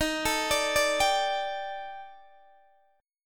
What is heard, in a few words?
Eb Major 7th Flat 5th, Guitar Open D; x,18,19,20,17,17; down